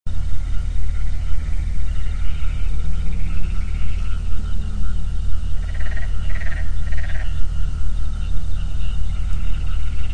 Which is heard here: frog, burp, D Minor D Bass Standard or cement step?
frog